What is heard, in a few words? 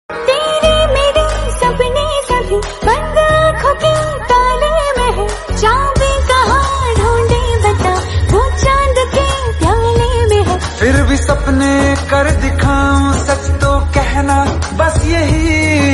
bollywood song ringtone